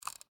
Mouse Wheel Move, Technology, Mouse, Wheel, Movement, Wireless, Laptop, Mouse; Computer Sound Effects; BlastWave